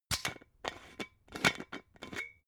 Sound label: glass bottle massive open slow